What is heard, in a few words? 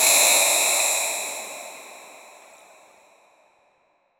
a spectral flash crosses, ghostly